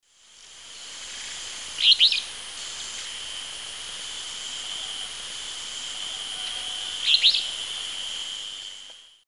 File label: Empidonax alnorum, song; S. America; Eastern Lowlands